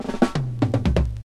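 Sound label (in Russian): соло на барабанах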